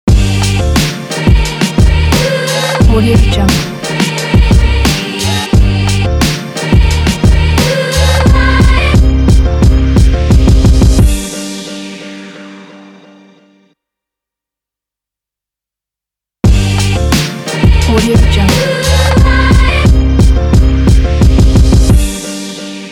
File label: a hiphop ident pack; vsinebeatz